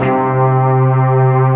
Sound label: FILM SCORE; Greytsounds; Scott Peers Sound Engineering